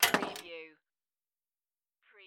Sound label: Pinball sound, clank then plate touch